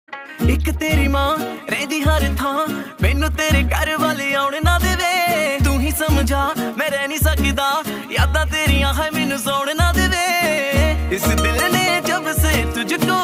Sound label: Punjabi Beats Ringtone